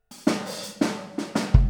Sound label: Boogaloo - Kick, 82 BPM; Drums; Intro; Sonic Foundry; Drums from the Big Room The Mixes